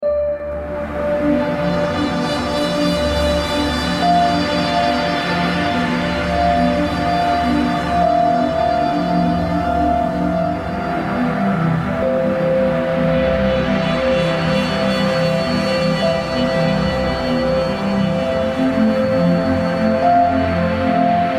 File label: Scattered Harmonics